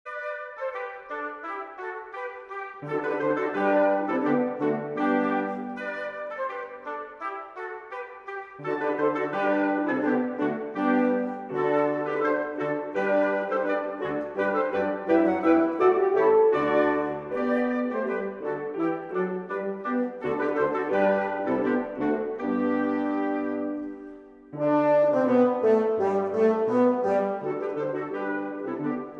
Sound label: deck the halls with boughs of holly instrumental